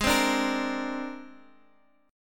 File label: C 7th Suspended 2nd Sharp 5th, Ab